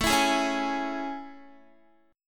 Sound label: A Major 7th A, Guitar; AM7; strummed; x,12,14,13,13,15